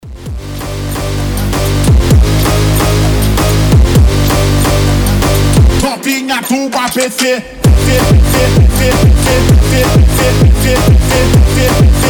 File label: conga conga phonk